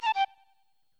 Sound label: Instr Flute; Backpack Hero